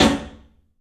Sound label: club snare, 3D Drums; 3D Sound